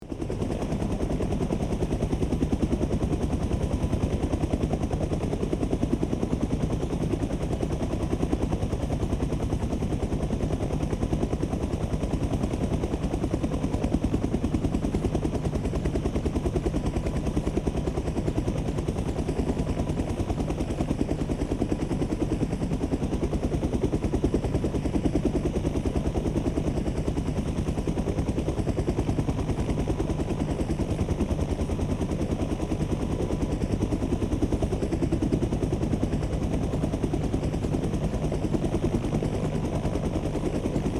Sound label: Helicopter idle chopper engine and propeller loopable